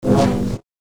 Double Bladed Saber Sound; FXHome

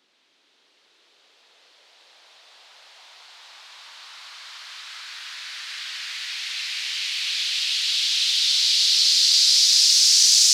Medium Sweep, White Noise

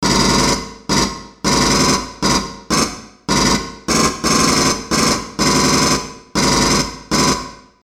M41A Pulse Rifle; FXHome; Bullet and Gun

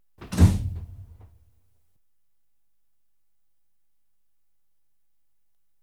Electric Trains Carriage door; BBC Sound Effects Library

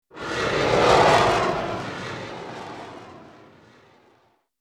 Bullet Time; FXHome; Bullet and Gun

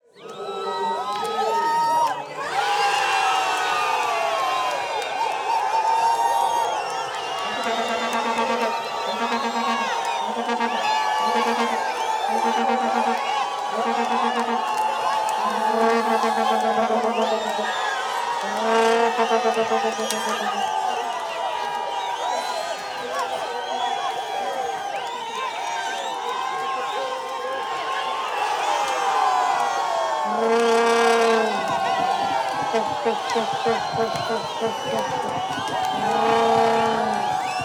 South African Soccer Crowds; TheWorkRoom Audio Post

